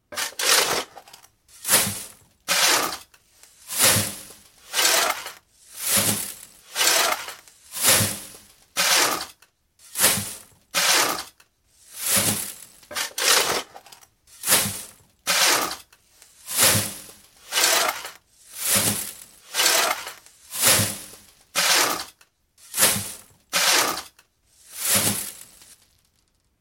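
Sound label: digging with a shovel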